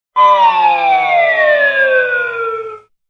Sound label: lose sound